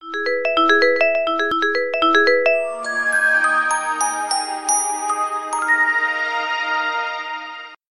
SMS Magic LG, ringtones

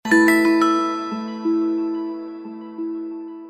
bell notification

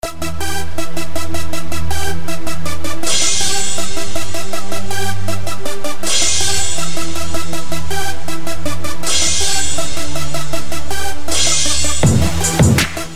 Techno Hip Hop

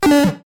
Bump; SMB3

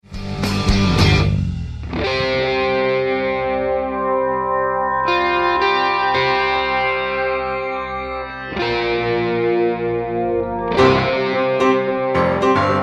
solo guitar; christian

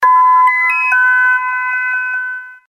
TONE

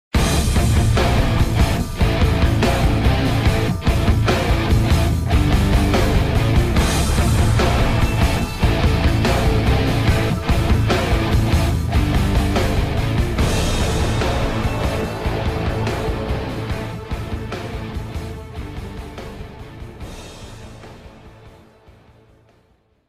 alert, soundtrack

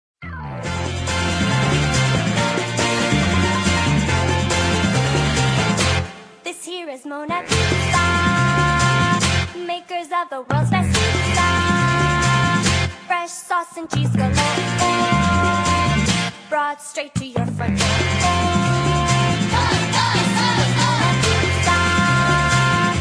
pizza song English version; super smash bros brawl

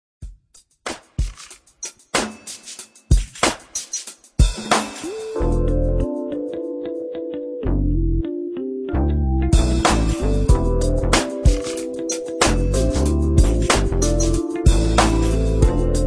g funk

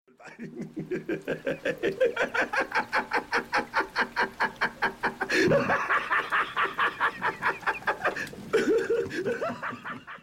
laught; Joker

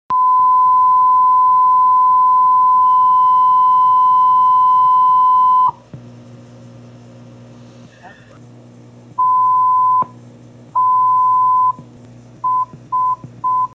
Fire and alert

